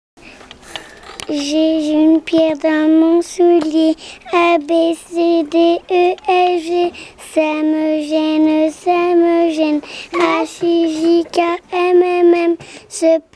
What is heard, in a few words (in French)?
alphabet